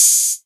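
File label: 808 Hat Open; Novation DrumstationV1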